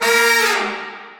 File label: Horn Sect Effect